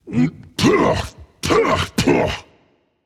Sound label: clear throat rage; chaac